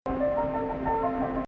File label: Rast Fein Ya Gameel nahawand; Maqam Rast